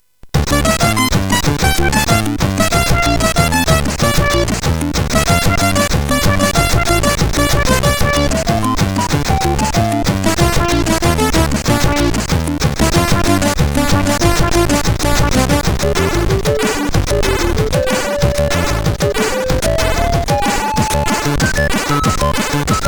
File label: sid CSG8580; CSG8580R5